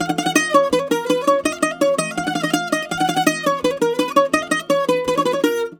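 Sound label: A#, bouzouki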